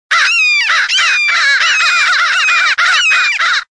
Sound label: Crow and Hawk Fight